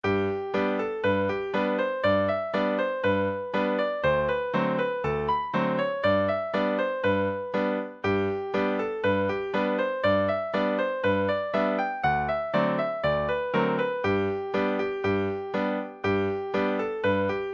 buffalo gals guitar

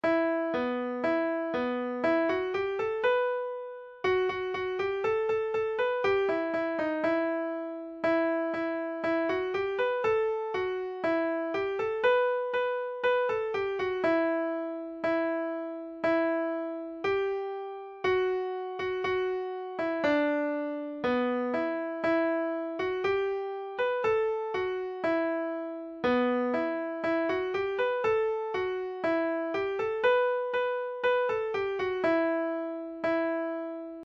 pickin on the banjo, folk music midi; the old moke

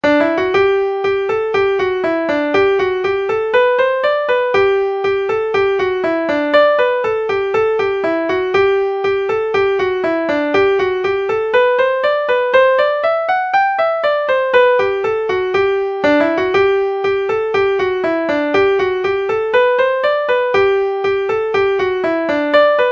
indian river hornpipe

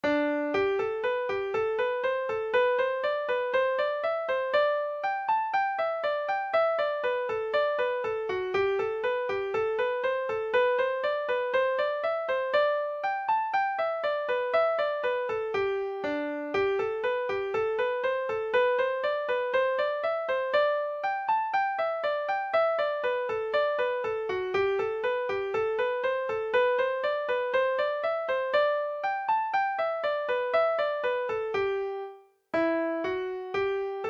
blackberry blossom improv

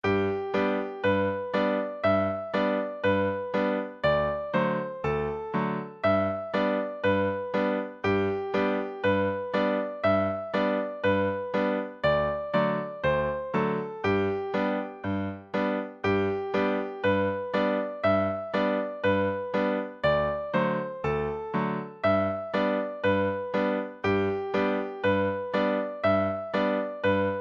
fiddle, session tune midis; buffalo gals

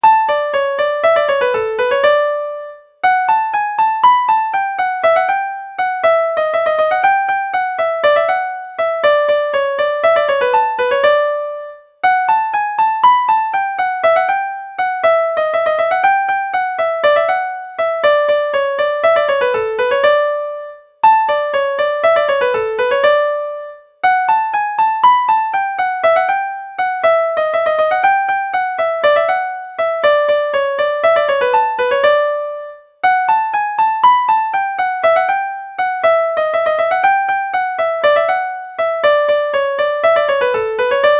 concertina polka

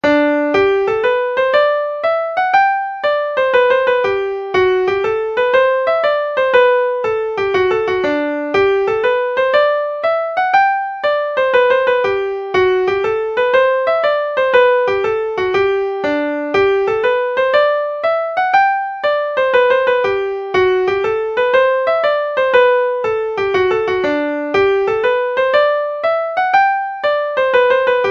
concertina hornpipe

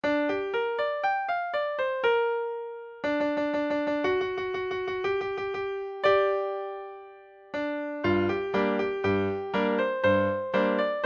kentucky mandolin

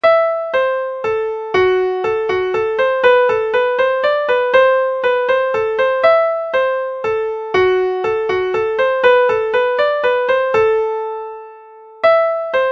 mazurka valse; les valcerves; m perrone

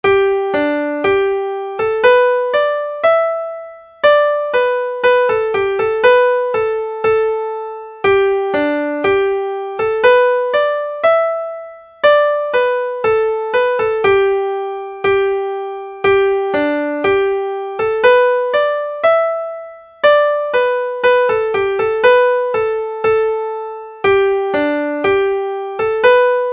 polka; knocknaborough

